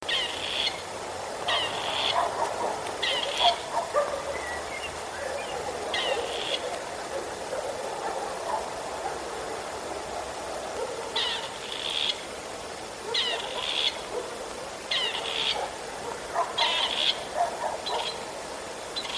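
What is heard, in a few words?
seraya kuropatka voice, grey partridge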